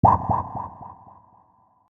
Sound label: shout